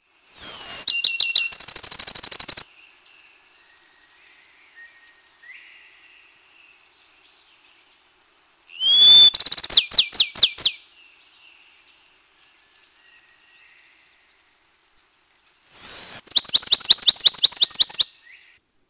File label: bachmans sparrow